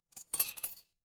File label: glass shatter